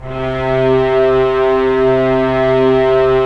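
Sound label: STR SC, strings; Roland - LA Composer vol. 4; Full Sec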